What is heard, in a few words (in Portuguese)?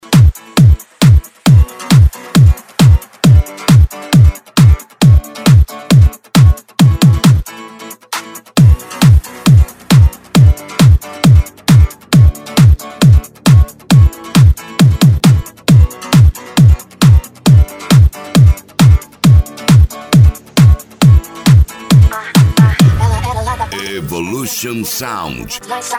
esp de pancada